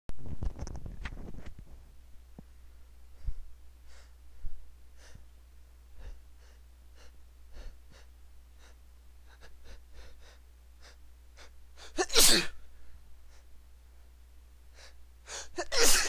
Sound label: buildups, male, sneezes